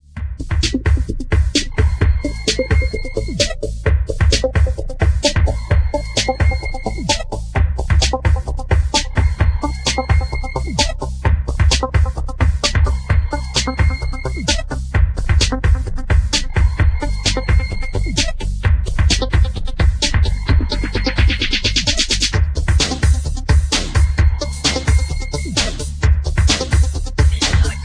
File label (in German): groovemaschiene; elektro